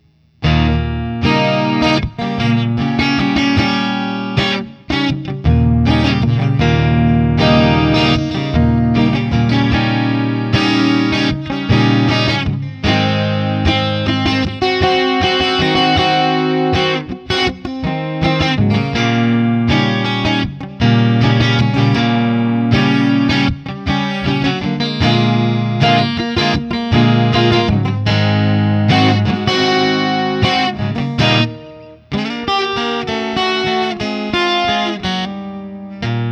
Crossroads Doubleneck 12 ODS100 Open 7th, Open 7th Chords; Guild Slash Crossroads Doubleneck